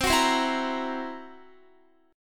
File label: C 7th Flat 5th C down Guitar; C7b5 strummed